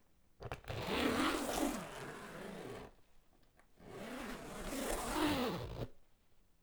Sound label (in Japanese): ファスナー